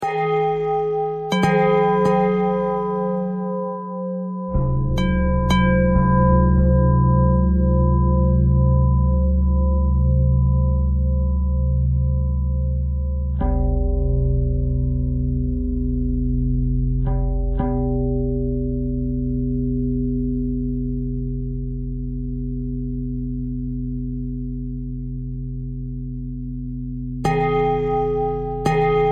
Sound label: bell bell thread simple, bells